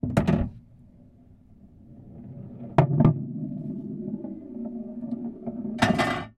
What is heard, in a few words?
pool snooker ball potted run through coin op mechanism recorded inside machine